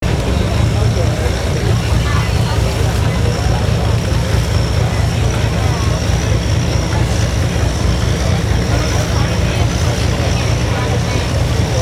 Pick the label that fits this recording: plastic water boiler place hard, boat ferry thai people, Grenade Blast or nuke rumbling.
boat ferry thai people